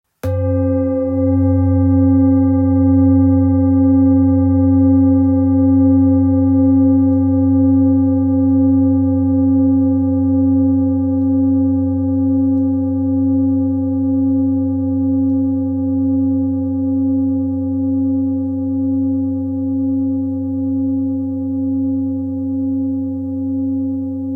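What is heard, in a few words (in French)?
bol therapeute fa, bols chantants tibétains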